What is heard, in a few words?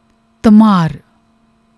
dhamar, musical instruments